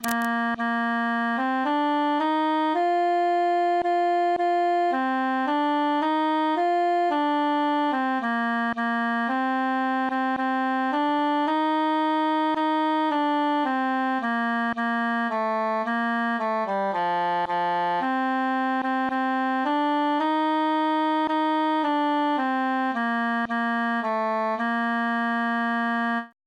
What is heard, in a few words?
tenor solo; es ist ein schnee